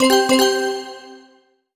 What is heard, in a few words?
Bell Voice Positive stereo; MUSIC EFFECT; Kitty Nigiri